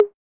Roland Juno D Perc Menu Kit

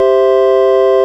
ORG VOX C, Continental

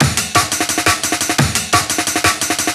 DNB BREAK; m8 breaks

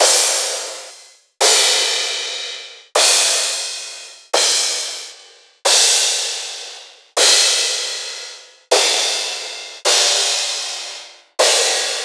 Crash Cymbals Synth Crashes; Ghosthack

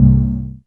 DRONER LO, ANALOG; Back In Time Records; Big Bang

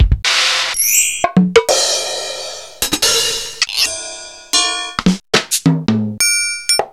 Kawai R-100

